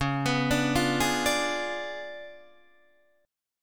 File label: C sharp 7th Flat 9th Guitar Open D, chord